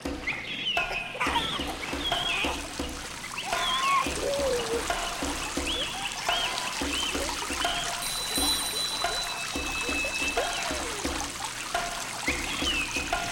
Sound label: Children Rain Forest River; hifi